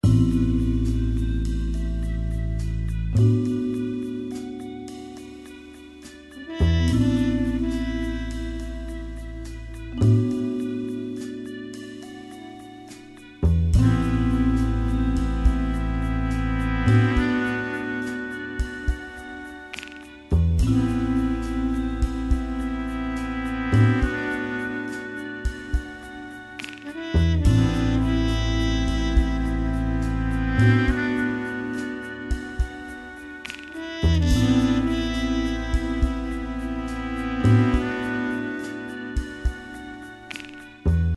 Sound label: training loop; Danger Mouse - Ultimate